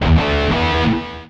Electric Guitar

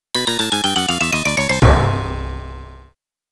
Player Lost Fanfare

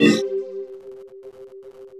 DELETE SAVE

